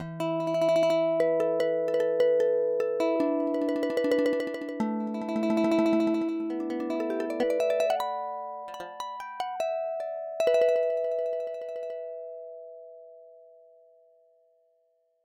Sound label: qin